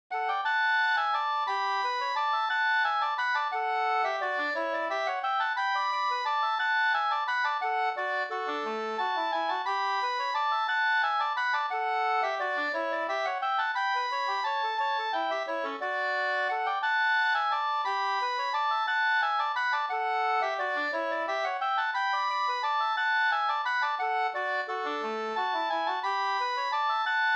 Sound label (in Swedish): vals; Nils Martensson Vallviken